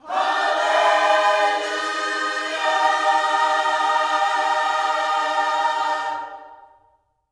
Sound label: HALLELU, Gospel